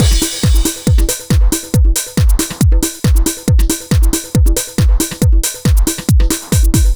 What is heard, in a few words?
Dance Drumloop; Drums; Dance Beats